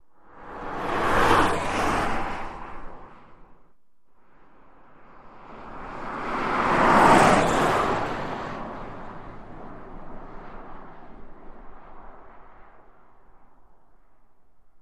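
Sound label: Car Fast By X2 Car Following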